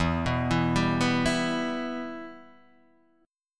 E-Minor; arpeggiated; JGuitar

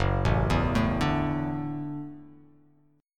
Gb Minor 7th Sharp 5th Gb; arpeggiated; 2,0,2,2,2,x,x,x; JGuitar

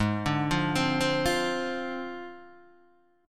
Ab 6th Flat 5th Ab, Guitar, DADGAD

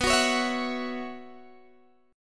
B Suspended 2nd Suspended 4th, Guitar, Open G

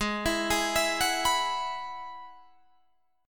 Ab Minor 7th Sharp 5th Ab, down, Guitar, DADGAD

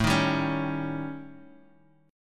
A 7th Flat 5th A, Guitar Drop D; chord

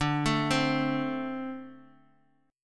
D 5th 7th D; D57 Chord {X 5 7 5 X X} arpeggiated

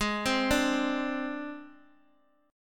G sharp Major Flat 5th, Guitar Open D

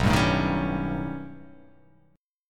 B Augmented 9th B, chord

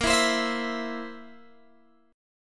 Bb Suspended 2nd Flat 5th Guitar Open G

